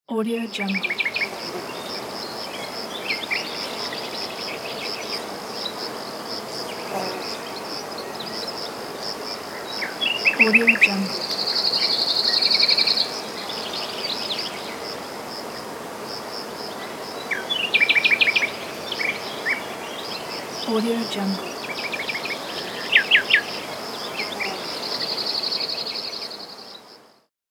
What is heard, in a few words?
southern ambience with birds and insects